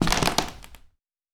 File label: Creaky Wood Floor